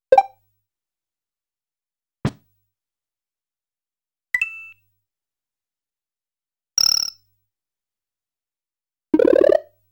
Colliding with Each Other ~ Hitting Against a Wall ~ Coin; super mario world live; Dragon Coin